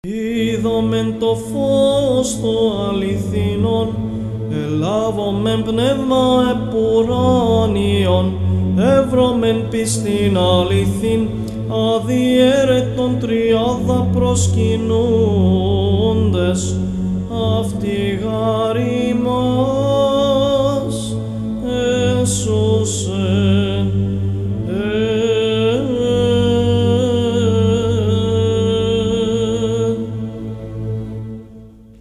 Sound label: Greek Orthodox Chant, Eidomen To Fos to Alithinon, mode 2